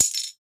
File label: glass breaking; breaking falling hit sfx